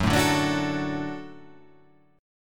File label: F sharp Minor Sharp 5th